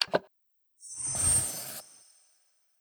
popup rating open; This Is the President

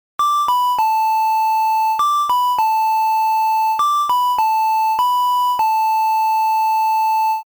Ocarina; Legend of Zelda Ocarina of Time; 8bit

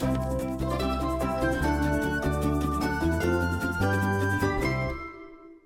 victory jingle; Super Lucky's Tale

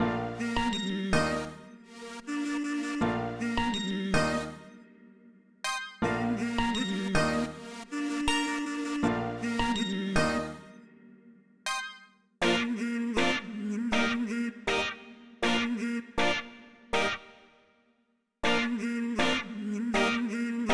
Melody Loop; bakugan champions of vestroia 2020; switch gamerip

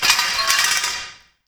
Scaffolding Break; angry birds rio

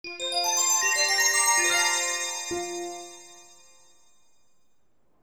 Sleeping Chime; astro boy ps2

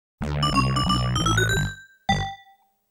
game over; bubble bobble

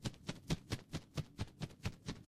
Appearing Scorpion Tail Loop; doodle jump 2; android

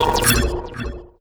error

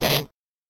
Player Hurt

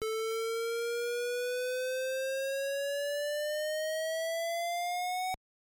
Flying Disc SFX; Duck Hunt

